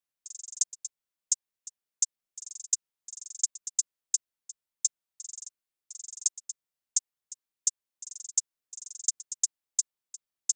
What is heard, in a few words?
Drum Loop, 85 BPM Hihat; Loop Stems; Cymatics; Vibes II Drum Loop Collection; Healing Drum Loop